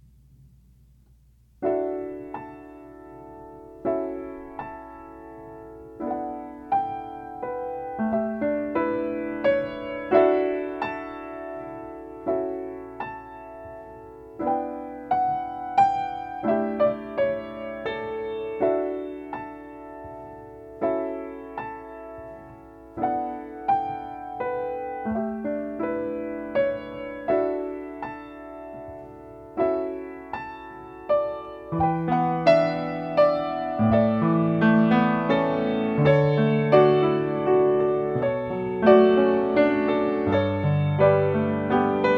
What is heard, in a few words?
audition accomp